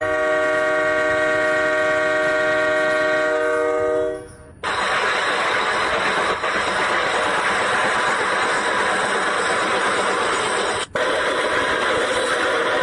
train horn sound alarm ringtone; samsung

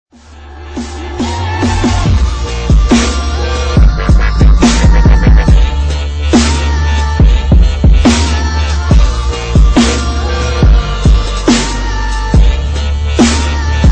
dubstep ringtone

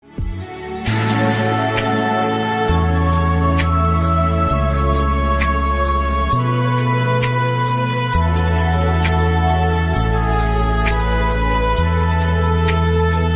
hindi sad instrumental ringtone

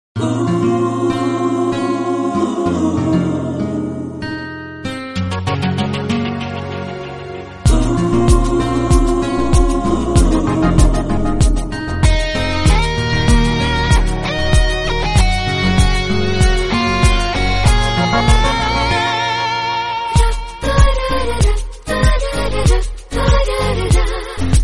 lovely background music song tone ringtone

